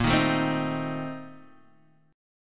A sharp Major 7th Suspended 2nd Suspended 4th, Guitar Standard; strummed; JGuitar